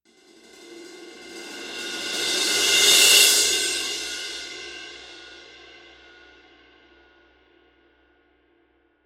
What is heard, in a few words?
19 Vault Artisan Crash; cymbal; Sabian